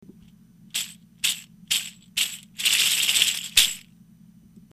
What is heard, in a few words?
suzu; 写真左の鈴の音です。; キャップの中のプルタブがシャラシャラという音をたてます。